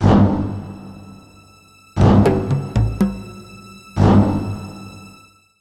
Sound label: Music Miscellaneous Sting